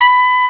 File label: TRP HI; CMI